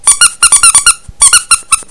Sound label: little squeak, toy